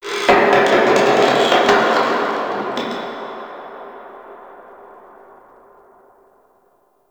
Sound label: Ice Metal Dist; Warframe; Corpus Ice Planet